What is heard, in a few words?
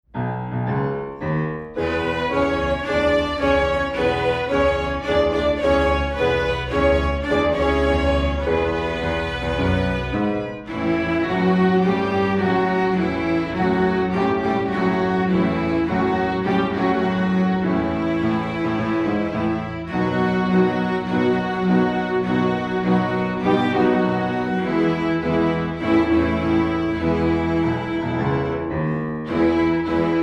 Blues